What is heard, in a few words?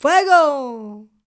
Flmnco F Vox; Roland BK-5